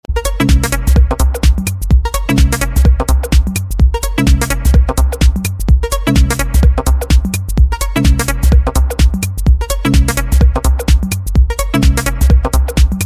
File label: Mobile Ringtone